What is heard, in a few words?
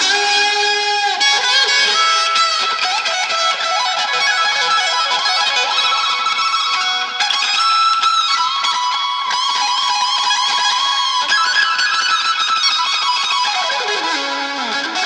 super Mega Win Loop; hotline2